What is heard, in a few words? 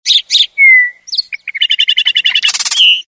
Bird; Motorola; C381-China